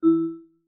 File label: Class Harp